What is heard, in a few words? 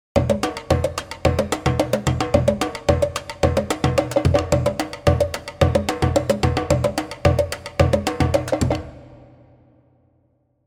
vibes percussion; chiqui audio